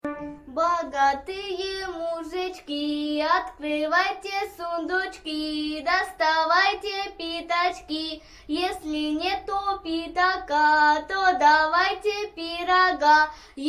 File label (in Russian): bogatyye muzhichki pesnya; russkaya narodnaya pesnya